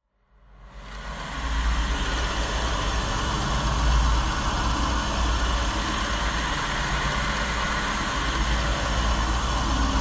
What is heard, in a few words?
dusty pad